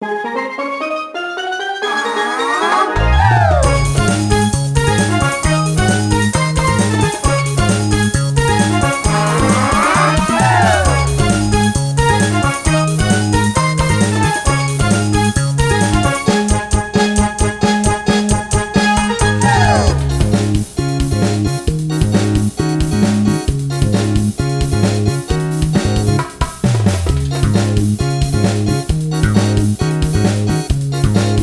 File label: Short Karaoke; Song of Prin